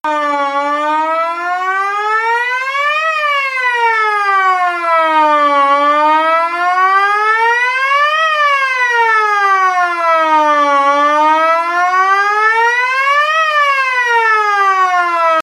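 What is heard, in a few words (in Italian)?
Rampa Vedetta, Sirena elettronica di grande potenza; Vedetta ABM 500 4TA; lasonora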